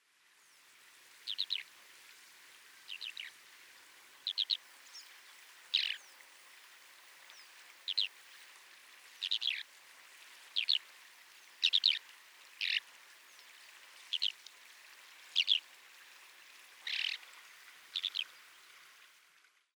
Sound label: call, balsis